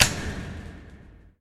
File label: closing iron door let fall